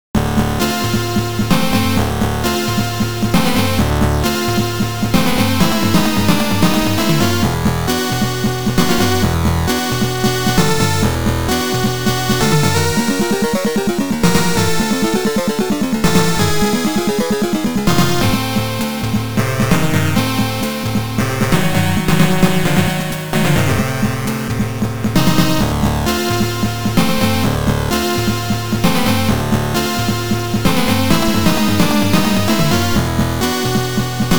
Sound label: Beat Back (Stage 2 BGM)